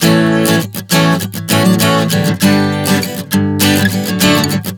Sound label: Progression 100 A-G-D, Acoustic Guitar, Chord Progressions 100 bpm